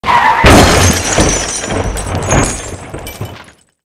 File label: crash; Apotheorasis